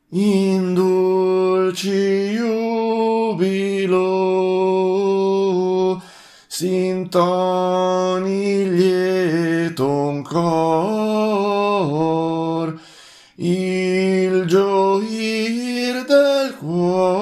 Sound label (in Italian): tenori; In dulci jubilo